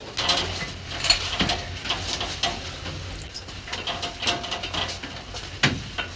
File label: Submarine Hatch Mvmt, hatch